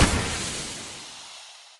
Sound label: Grenade Smog; MilkChoco